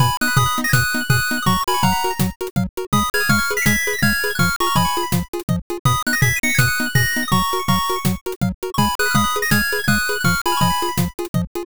bgm bright

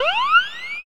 ghost scream

